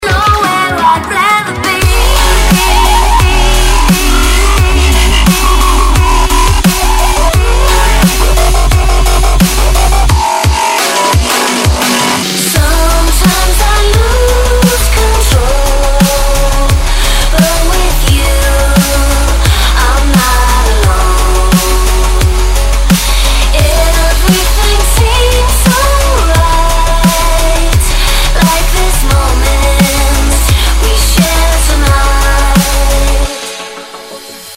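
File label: beautiful vocalstep beautiful dubstep; krasivyy vocalstep krasivyy dubstep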